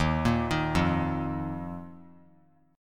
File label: D sharp Suspended 2nd; arpeggiated; JGuitar